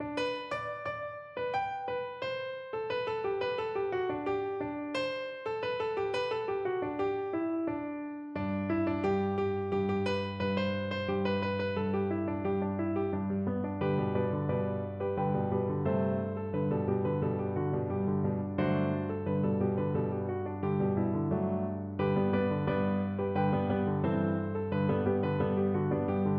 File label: cotton eyed joe piano duet